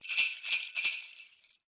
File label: bell